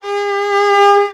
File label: VIOL G#3, VIOLON, Arabian Traditions; USB Soundscan vol.43; AKAI